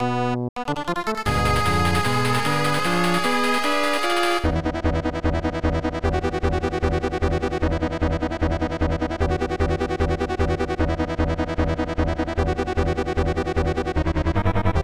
Surprise; Dragon Slayer The Legend of Heroes II; SNES

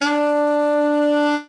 Sax tone; lego my style preschool

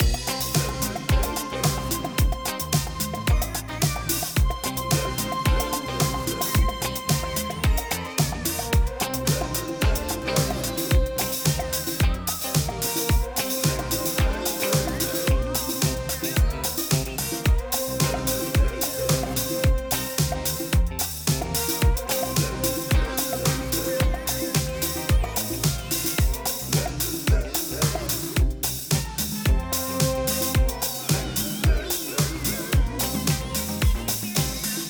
Music Disco DJ Spin

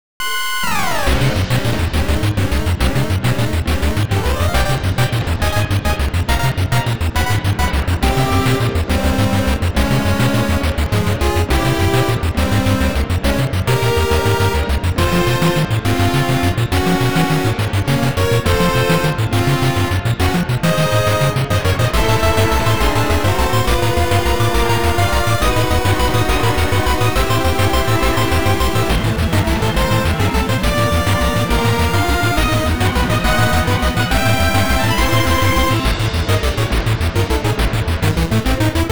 8bit Version; Super Mario Odyssey

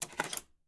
Cartridge Dismantling Chip; miside demo

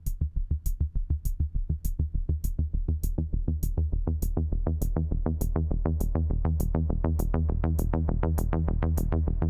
Wave attack Base

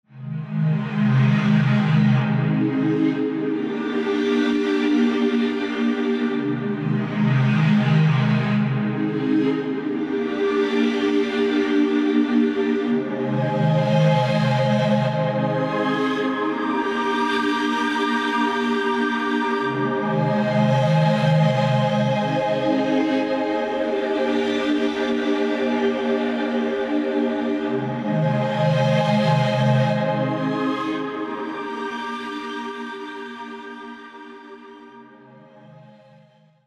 Tense; The Innsmouth Case

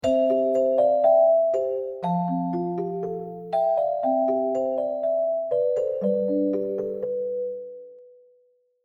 Music Short intro; Naughty Bear; ps3

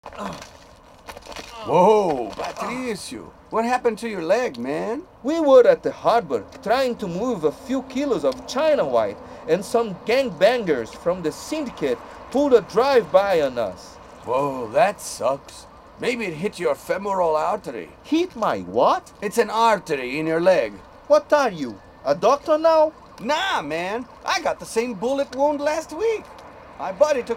Samba; Pub Car Samba Medical

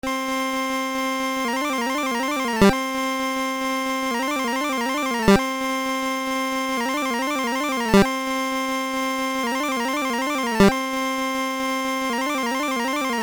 Karate Power; Clash at Demonhead; nes

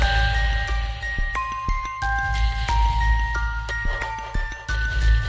boot Sound; Zombie Brigade: No Brain No Gain; Wii U

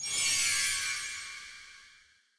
Btl Hitlrng Light; The Denpa Men; Android

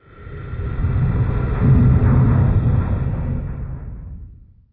Ambient

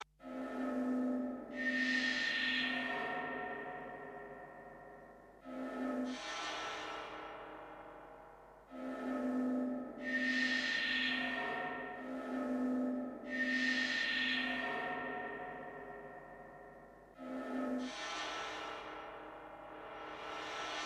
Vocals; PixelJunk Shooter; PS3

